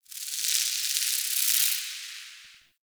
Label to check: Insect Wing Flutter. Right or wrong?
Right